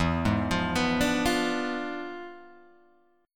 E 7th Flat 9th Guitar Open G, chord

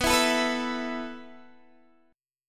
B 7th Suspended 4th B down Guitar Half Step Down

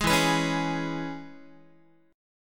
E 6th, down, Guitar; strummed; JGuitar

